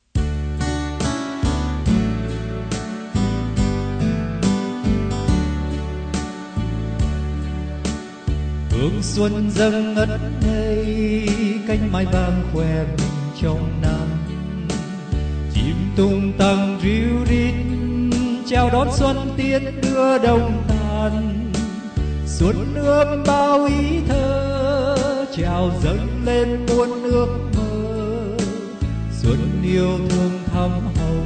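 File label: soprano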